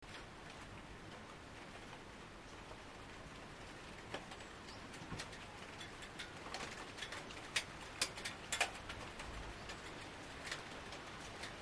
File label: falling rain